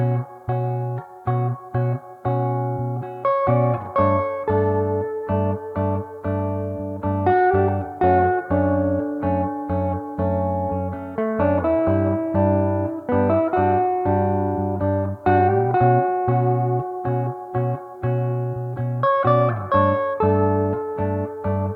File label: wind, wild weather